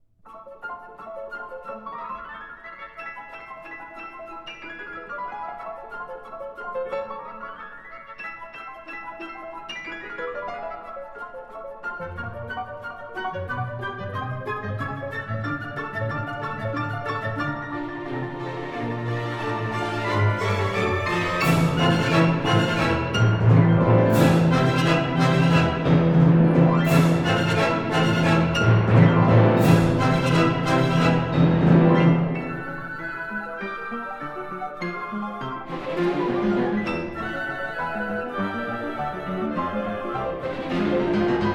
Piano Concerto No2 in A major Allegro animato Stretto molto